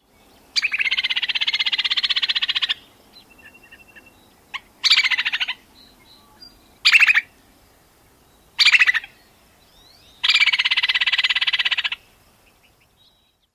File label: chatter calls